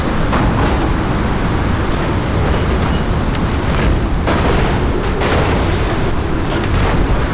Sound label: tornado